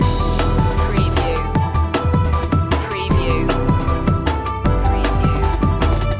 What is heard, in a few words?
car advert music loop